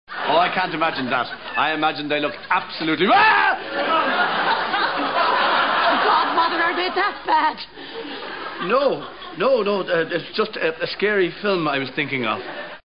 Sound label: GLASSES; Father Ted